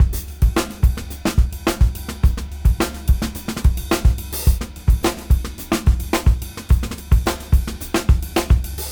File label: Junky Funk 107 BPM